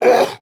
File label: Pain, Barks; Kuva Lich; Persona A